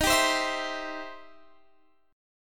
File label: E Minor Major 7th Sharp 5th Eb